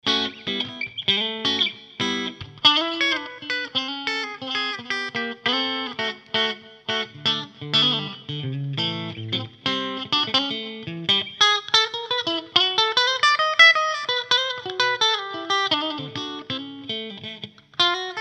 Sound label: clean; kong tubefive head